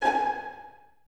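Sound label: violins G#5 pizzicato, String Ensembles; Miroslav Vitous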